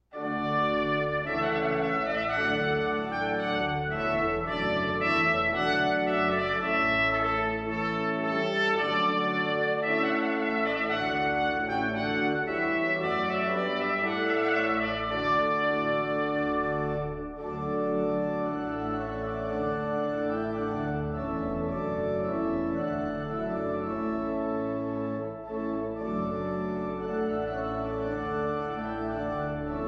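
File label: trumpet voluntary trumpet and organ; weddings; clarke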